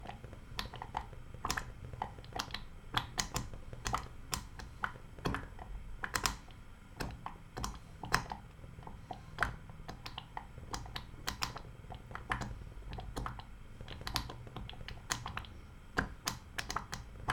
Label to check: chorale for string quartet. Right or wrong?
Wrong